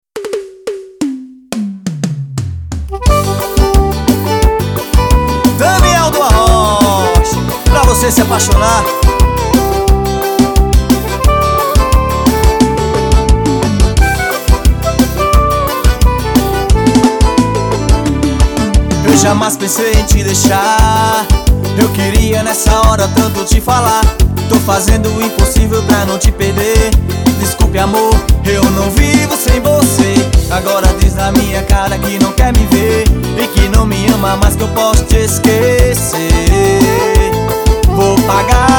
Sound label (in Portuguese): musica arrocha